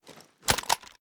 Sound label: reload empty xmag end; gmod